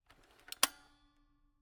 Camera, Kodak EK2 Instant, polaroid, large, plastic, springs, reset; Eiravaein Works; Vaeyan IV